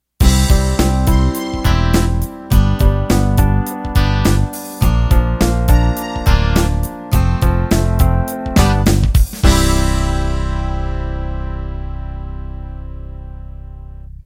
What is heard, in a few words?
Pop Ballad End